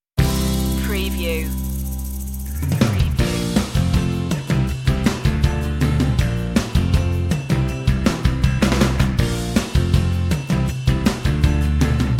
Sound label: no melody